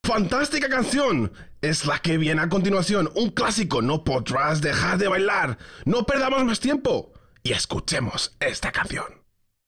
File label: Music Vehicle Radio Events